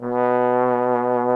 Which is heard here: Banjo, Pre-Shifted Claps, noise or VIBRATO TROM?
VIBRATO TROM